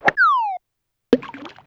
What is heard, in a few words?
Water In One, Yamaha DD-35